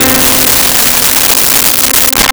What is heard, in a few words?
GM Piano C4; Nintendo; July 2020 Gigaleak